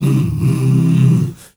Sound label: MALE HUM; Spectrasonics; Heart of Africa